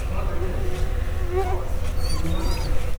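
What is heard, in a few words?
mosquito; allathangok; termeszetben; szunyog; tiszavalk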